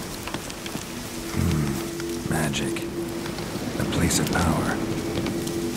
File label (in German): raum betreten; The Witcher 3